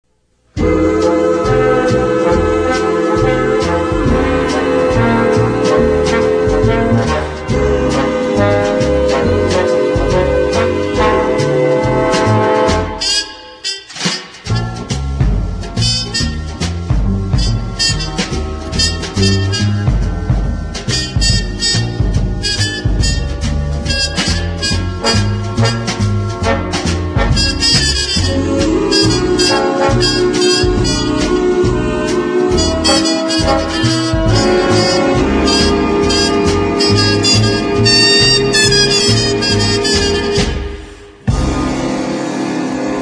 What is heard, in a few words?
Radio Jingle Trumpet Tune; WNEW AM 1130 NY